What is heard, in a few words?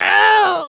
scrm; The Elder Scrolls III - Morrowind; scamp